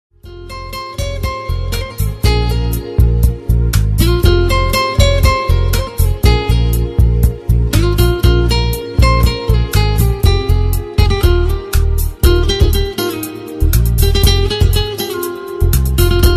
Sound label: Dance Music